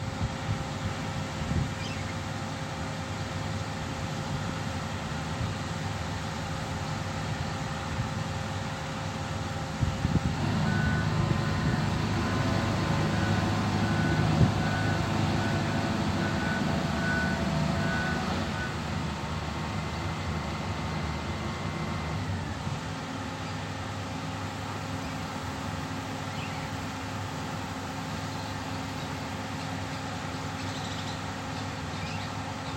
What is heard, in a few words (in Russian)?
zvuk tyazheloj tehniki rabotayushej na stroitelnoj ploshadke peremeshayushej gryaz